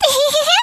combobreak

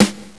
soft hit normal

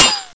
soft hitfinish

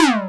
hitsound

